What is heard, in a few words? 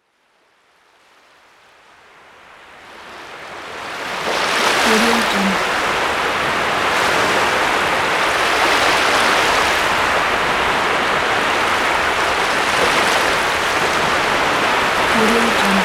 swashing water sea waves; cyrilkel